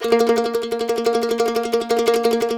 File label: C E trill A#, Blues Guitar